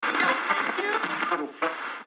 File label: hover sound button